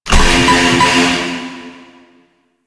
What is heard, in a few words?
Vault Alarm On Off; Warframe; Entrati; Vaults